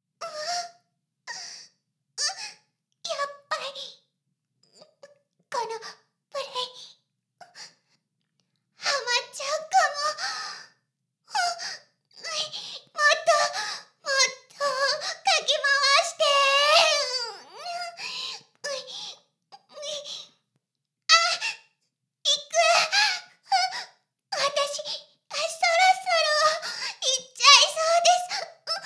Ｈな音声; ASMR; ダメなメイド

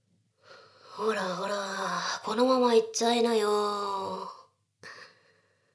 しこしこ; ダウナー風俗

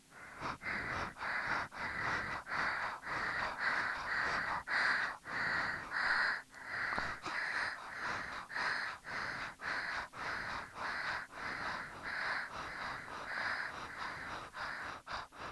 二人の吐息; ASMR

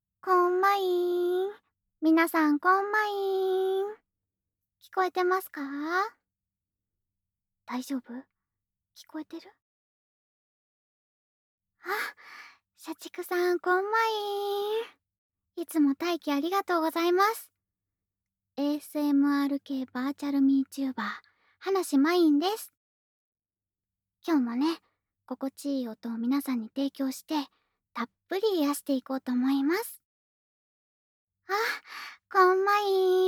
耳ふ～ -EAR BLOWING-; 職場にて・１; 耳ふ～配信

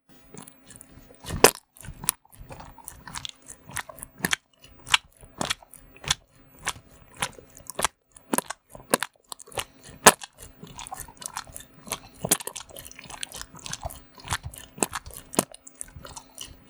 くちゅ

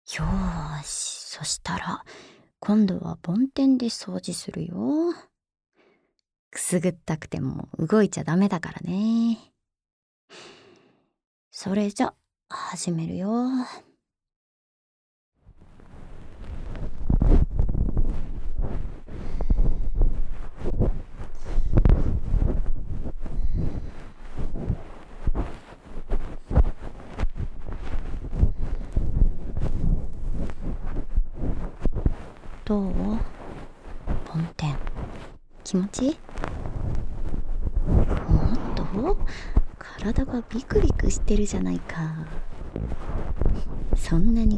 梵天で左耳の耳掃除

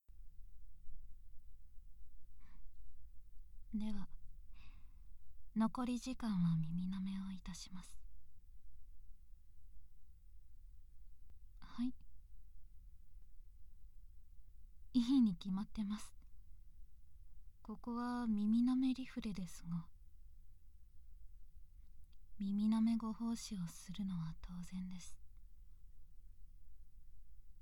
たっぷり耳舐め

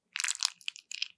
挿入; ASMR

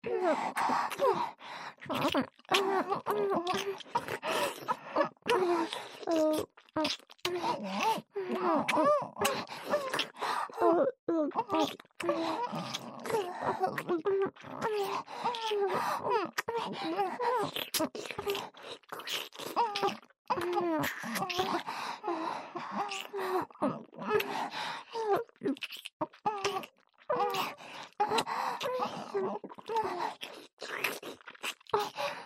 ディープキス; 美月＆美星とWディープキス

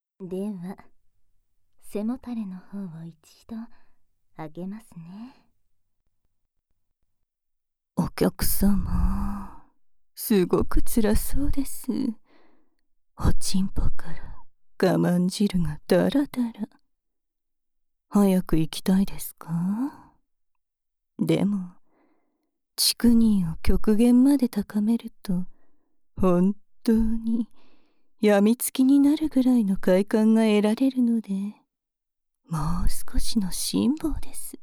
耳舐め囁き妄想耳フェラ＆ねっとりチクニー; SEなし

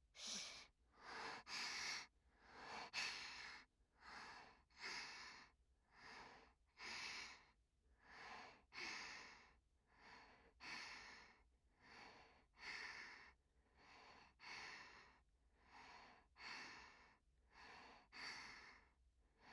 呼吸音～軽め～; 黄名子

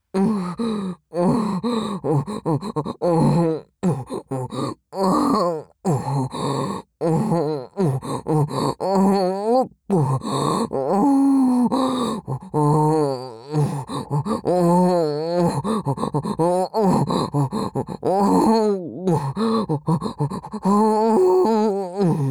喘ぎ; ASMR; 左; 媚薬で限界を超えたビンカンちんぽで口マンコ犯し

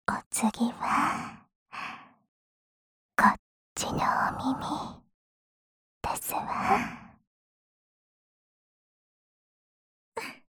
『耳かき＆にゅるにゅるお耳マッサージ（右耳）』ASMR

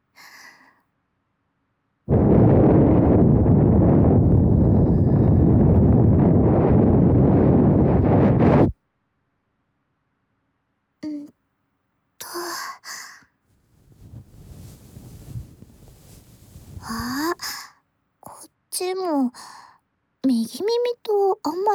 ヒノキ 耳かき と ゴム刷毛 での 左耳 耳かき

